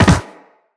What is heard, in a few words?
Land Soft Metal, Footsteps; Characters; Operator